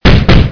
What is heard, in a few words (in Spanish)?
Caminar; Scorpion CSO